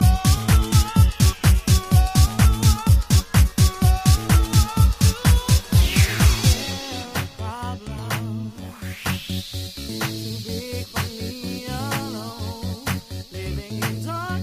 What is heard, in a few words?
Groove Vocal Dub